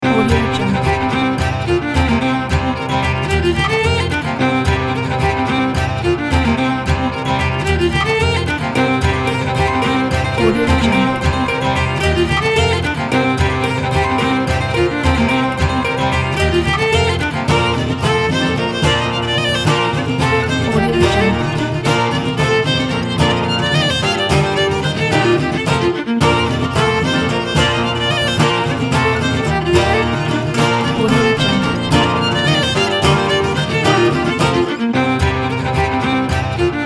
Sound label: irish pub party; sonicmood